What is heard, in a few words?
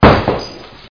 Bottle Break